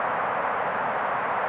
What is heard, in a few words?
TV Static; zps; Ambient